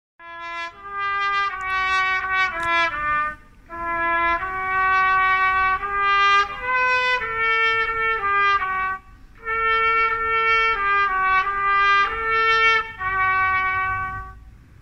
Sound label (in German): trumblaeser